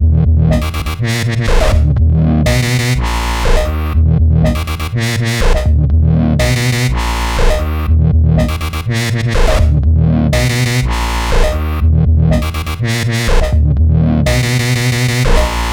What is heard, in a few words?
122 B# Full Mix, Complex Electro Synths; Toxic; Prime Loops